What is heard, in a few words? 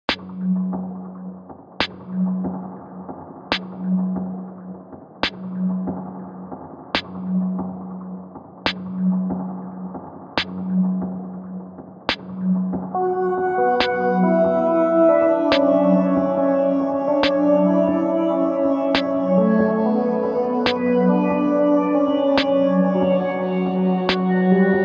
Airy